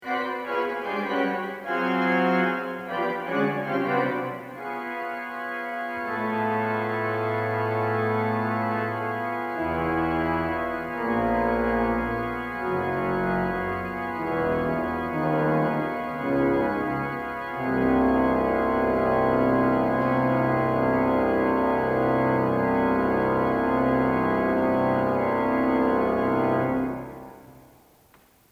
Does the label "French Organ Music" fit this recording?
Yes